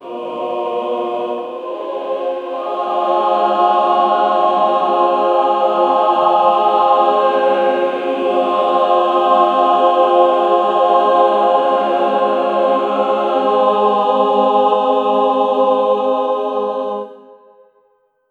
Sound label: gregorian choir sound